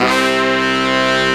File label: BRS, R&R Horns